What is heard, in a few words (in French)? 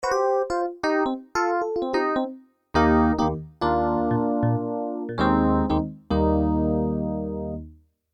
Synthe DX7 son; Electronique